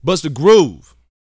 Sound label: VOX, R&B Dance, HIP-HOP; Spectrasonics Vocal Planet